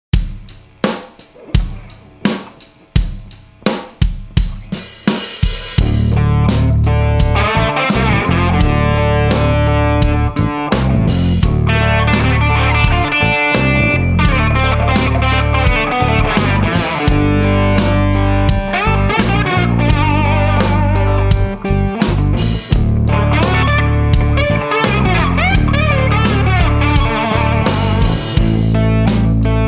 slide mix, guitar

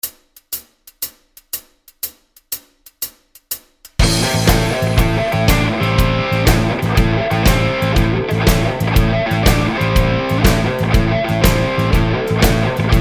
slide backing, guitar

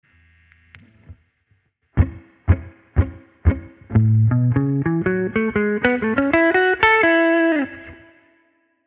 blues track

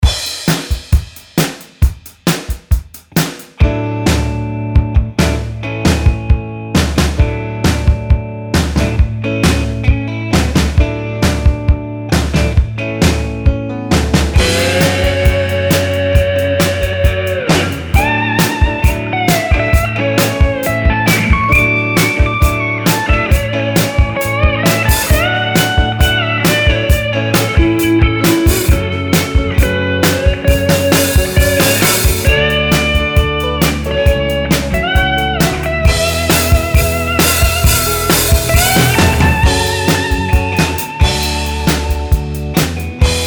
slide solo mix

